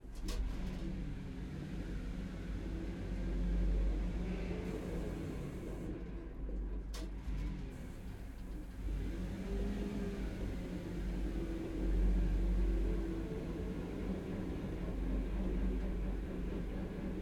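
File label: BRDM onboard Slow Drive Stop Off Interior, BRDM-2 APC; 1964; DPA4021; Pole Position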